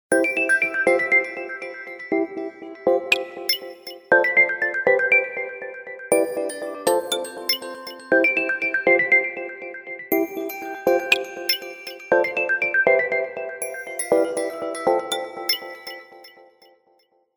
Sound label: Swirl, ringtones; Alcatel Idol X